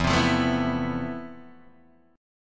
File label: F Major Sharp 11th E